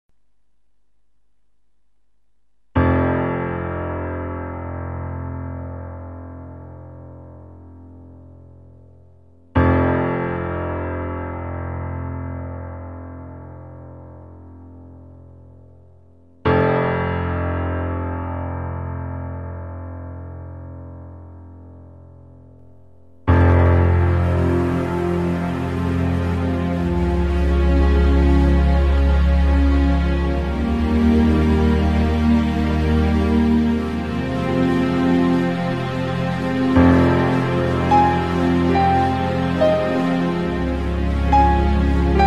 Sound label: Emotional Dark Music